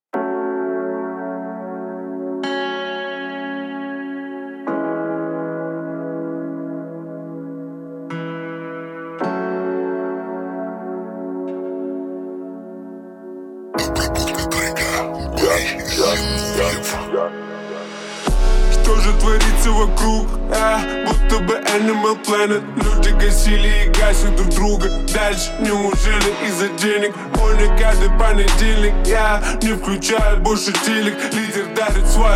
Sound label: 28-31Hz Low Bass; Animal planet